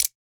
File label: UI hover